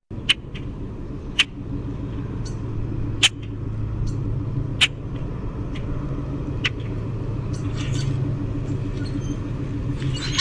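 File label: Common Grackle chaa chack squeak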